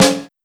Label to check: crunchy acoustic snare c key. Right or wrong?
Right